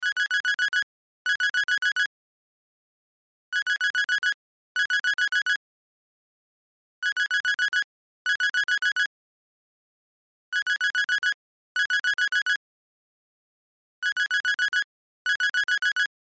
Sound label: Cell Ringtone; Loop; Cell Phones; Blastwave